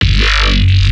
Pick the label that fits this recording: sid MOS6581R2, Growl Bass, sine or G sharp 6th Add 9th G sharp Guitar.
Growl Bass